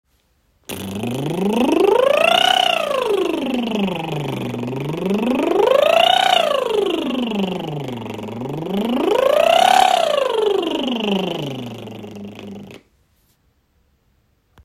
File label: sample liproll